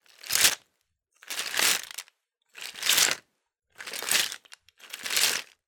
office stationery pens etc in large pencil case tips movements